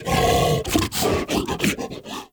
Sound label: Juggernaut Found; Infested; Truffle Hunt